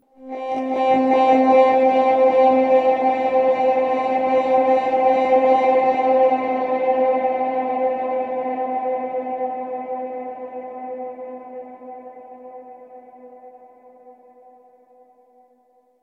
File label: Stinger Combat Drone